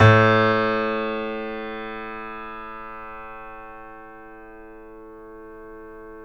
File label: piano A2